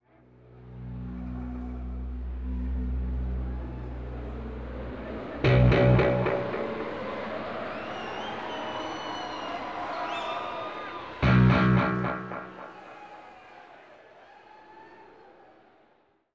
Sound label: Bass